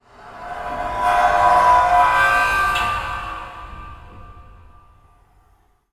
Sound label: industrial heavy door open screech reverb